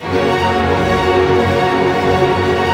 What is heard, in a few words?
ARP F# MJ FF, STR ARP MAJ; Sound On Sound - Peter Siedleczek's Orchestra